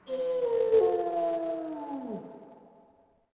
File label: wolves; Ambient; MX vs ATV Unleashed